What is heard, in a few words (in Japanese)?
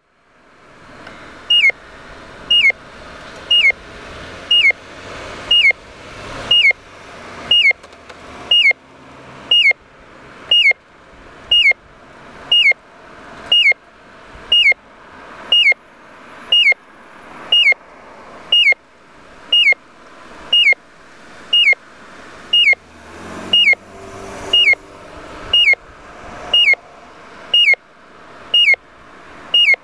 rikuun M82NO piyo, kosaten saitama tokorozawa; chuokoenmae